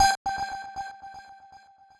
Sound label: alarm notification; Obras Zaragoza